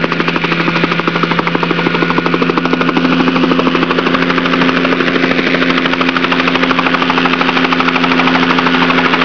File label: chopper